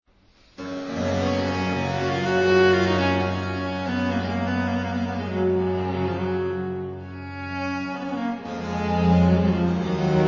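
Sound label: G major prelude; Marais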